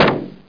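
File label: ricochet; flying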